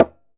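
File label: conga pop; E-MU Producer Series Vol. 7 – Old World Instruments; Drums&Percussion; Salsa Kit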